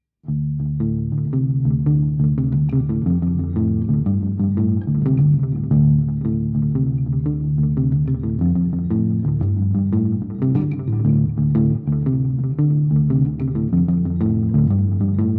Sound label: fearless riff